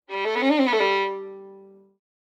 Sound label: Violin Whole Note Runs; SoundsCrate